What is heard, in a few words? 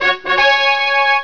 enter town; Exile III v1.0